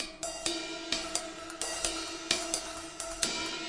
130 tripping brushcym ditty, drum loops and breaks